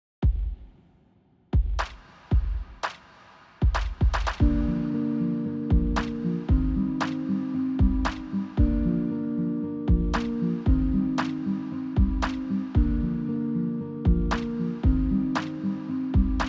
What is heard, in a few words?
Dub